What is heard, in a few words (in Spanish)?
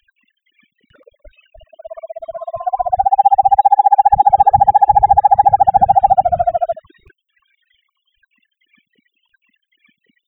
tamborcito grande; Megascops sanctaecatarinae